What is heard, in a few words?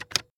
typewriter key hit